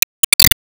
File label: Ingame Score Count Plus; Disney Star Smash; Android, iOS, Mobile